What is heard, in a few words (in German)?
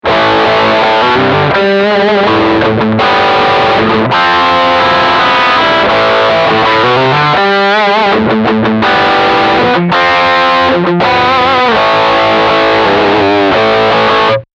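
Distortion Riff Stegpickup, guitar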